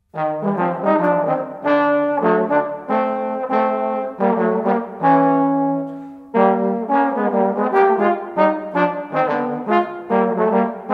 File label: Jazz Duets; Three Jazz Duets